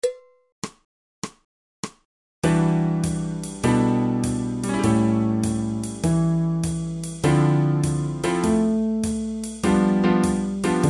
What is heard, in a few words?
2beat, fretless bass